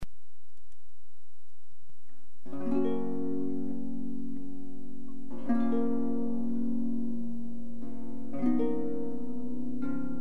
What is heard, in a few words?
Harp